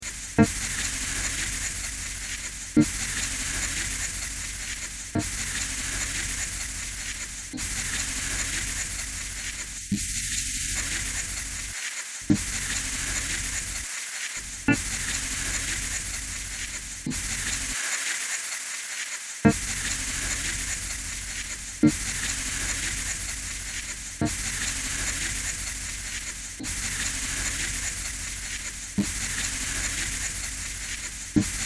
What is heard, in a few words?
soft rain; coldfront